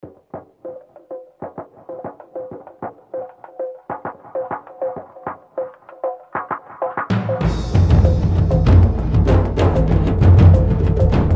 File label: jingle; „EcoTV Jingle“; Trompete, Flügelhorn; Posaune; Violoncello